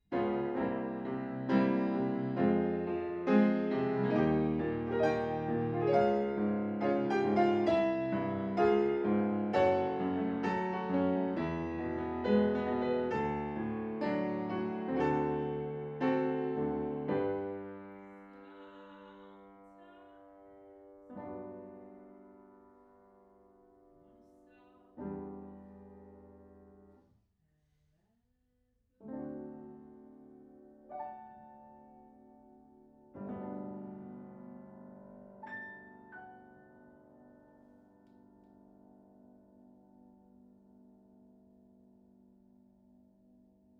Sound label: Piano Room